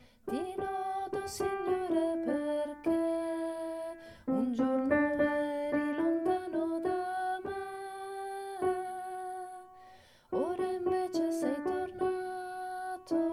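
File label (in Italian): Cantico Dei Redenti, contralti strofa 1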